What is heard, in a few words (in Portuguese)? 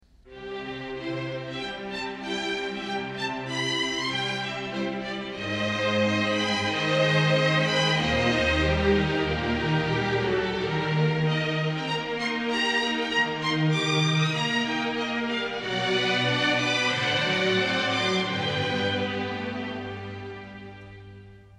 cordas